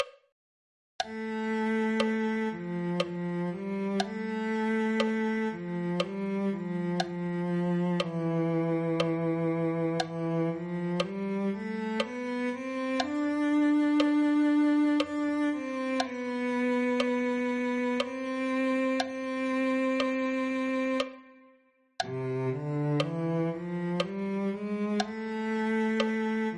cello

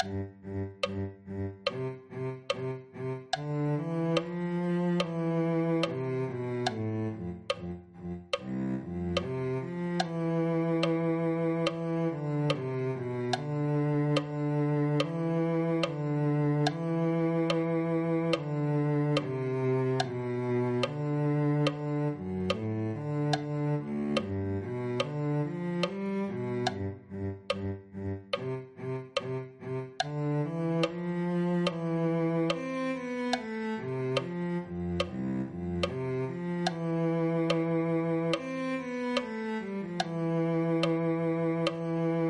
cello

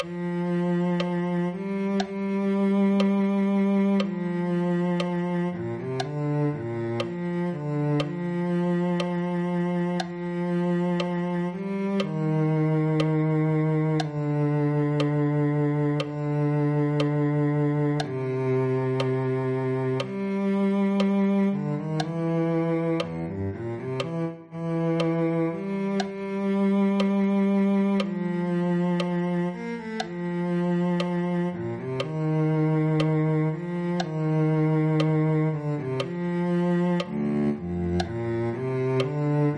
cello

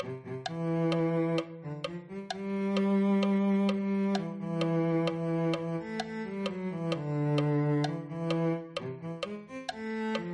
cello

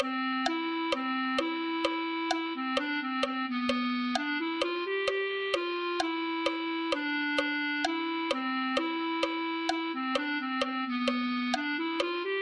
clarinet

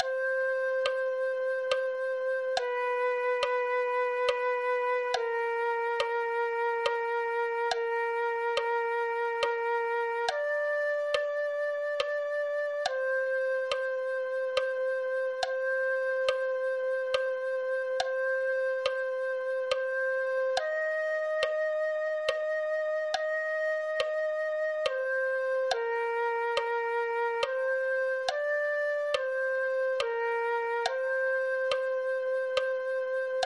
flute

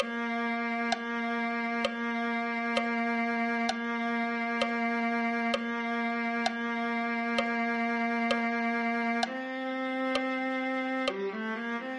viola

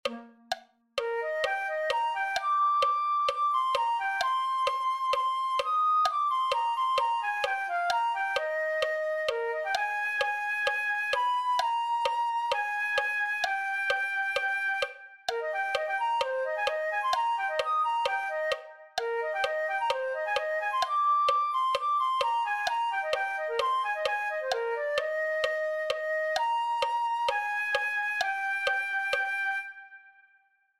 flute

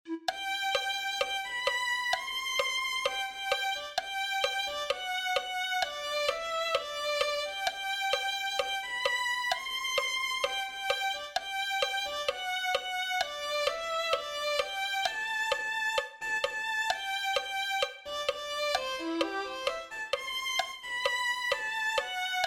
violin

